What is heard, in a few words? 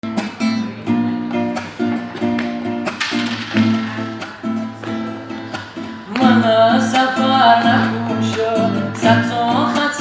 Guitar Version